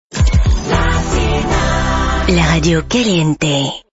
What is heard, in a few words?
jingle; latina